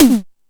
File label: Hip Hop, Drum Machines E-MU Pro-Cussion